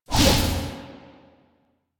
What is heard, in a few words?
Archwing Scythe charge swing whoosh; Melee; Warframe; Exalted Archwing Scythe